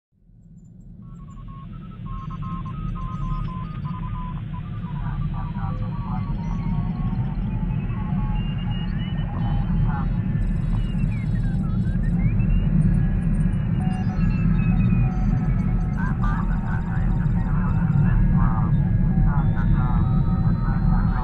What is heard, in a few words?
Star Destroyer Bridge Ambience sound; FX